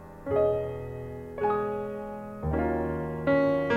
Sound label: Loops; Polyend; Loops n trouble